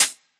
Close Hi Hat, dubstep; Drum Shots; GhostHack; Demolition; Venomous

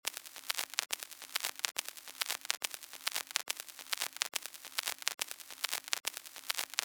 Vinyl FX Loop 70Bpm, Lo-Fi; HipHop; Construction Kit; STEMS; Ghosthack; Ghosthack Advent Calendar - Day 4 - Lo-Fi Kit

